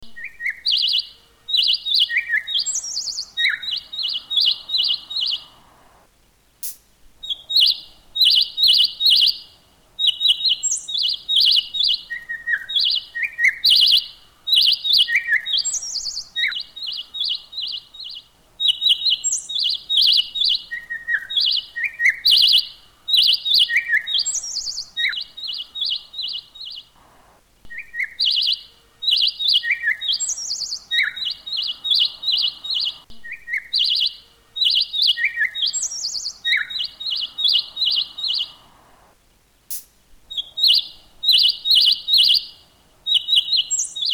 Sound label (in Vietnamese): tieng chim hot